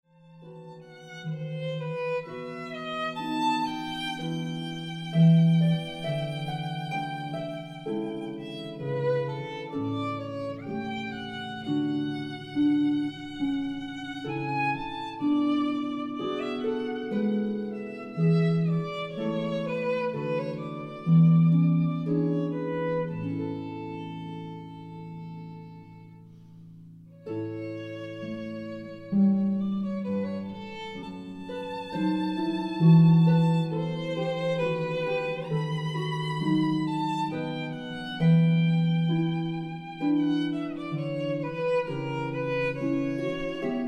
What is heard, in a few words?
air on g string with violin, harp